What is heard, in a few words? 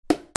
GAMES